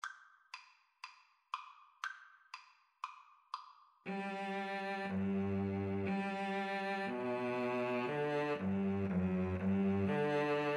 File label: handel conquering hero, Oboe Cello Duet